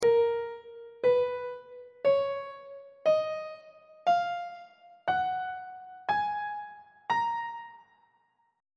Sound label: b flat phrygian mode note positions on treble clef